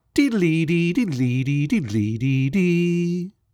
Classic ringtone; Foley; Judge John Hodgman

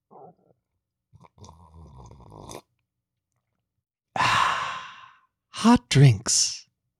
Sipping hot drinks; Foley Library; People Sounds; Secretly Incredibly Fascinating

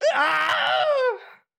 Wilhelm Scream; Foley Library; Danger Sounds; Let's Learn Everything!

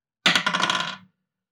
Dice roll; Foley Library; The Adventure Zone

